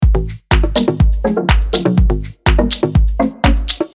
Syncopated Beat + Bass + Chord

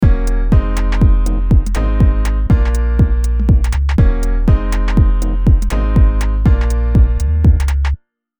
Ensemble

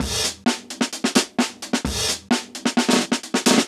Break Processed

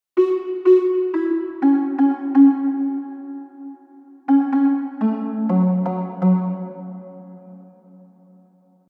Synth Melody

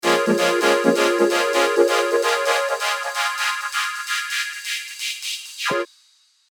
Build

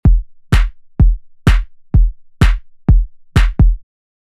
Kick No R bass

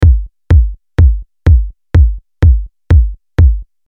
deep h loop

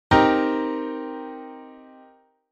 Cm7 Piano

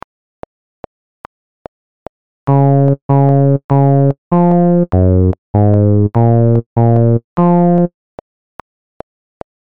3 4 metronome

